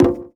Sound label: CONGA; E-Lab; X Static Goldmine 2; akai